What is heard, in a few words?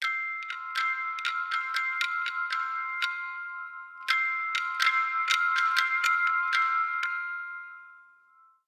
Icicles, alarms